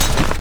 Generic Vault; Warframe; Characters

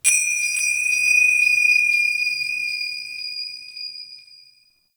Temple High Bells; Ambience; Corpus Ship; Temple One

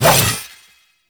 Two Handed Nikana Swing; Warframe; Duviri; Dax

